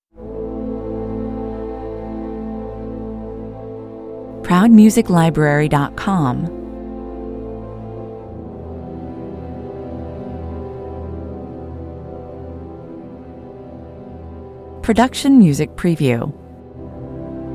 strings only

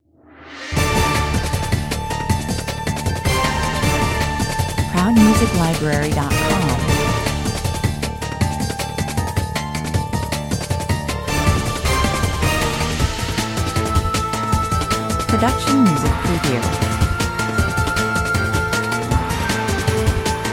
asian assault 160 bpm

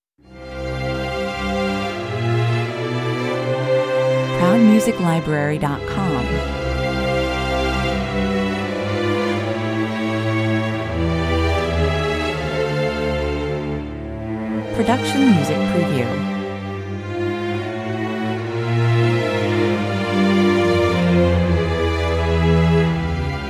thoughtful night 80 bpm

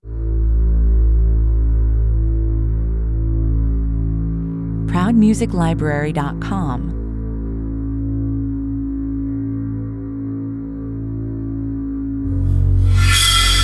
horror 138 bpm

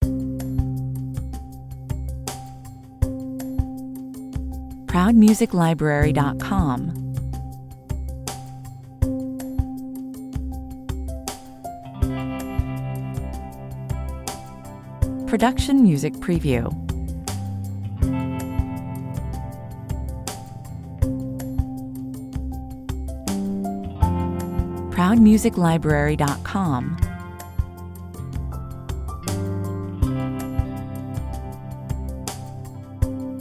peaceful ocean 160 bpm

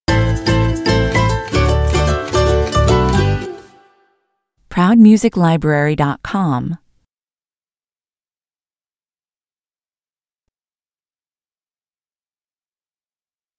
ukulele joy stinger

